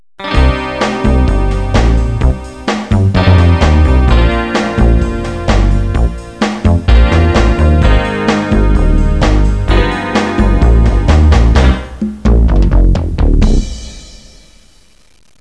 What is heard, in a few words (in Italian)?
musica newage